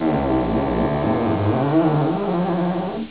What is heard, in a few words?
door creak